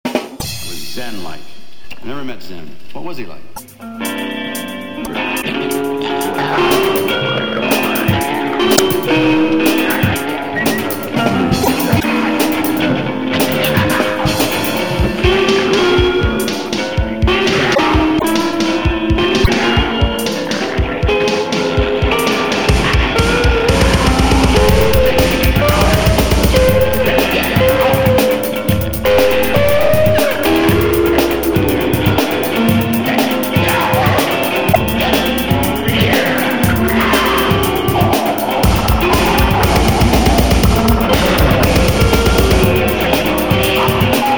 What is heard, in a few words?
samba